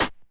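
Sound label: Closed Hat, DIGITAL KIT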